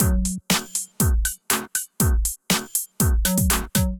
Sensor Kit, Drums; Ableton; Factory Packs; Build and Drop

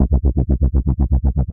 Aqua Wobble, Synth Bass; Ableton; Factory Packs; Beat Tools

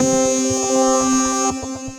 5th Lead; Ableton; Retro Synths; Vector; Vector Performances

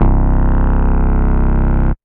Hacksaw Bass; Ableton; Factory Packs; Chop and Swing

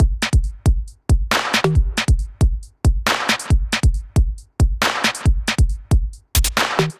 Kit, Drums, Basic; MIDI Clips; Dear Riban Kit; 137 bpm; Ableton; Factory Packs; Skitter and Step

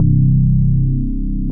Grundgy Bass; Drive and Glow; Ableton; Factory Packs

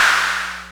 Clapbox Noise; Ableton Foundation FreePacks

